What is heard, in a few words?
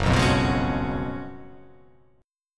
F sharp Major 7th Suspended 2nd Suspended 4th; F#M7sus2sus4 strummed